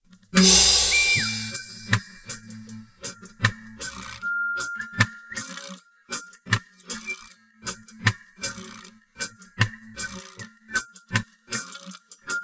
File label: drums